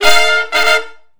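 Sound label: R&B Riff (F) harmony, Horncraft; Sections; Crimson Blue and Fabulous; Sony Creative Software; Horncraft 4 RnB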